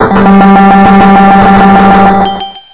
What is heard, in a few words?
reverb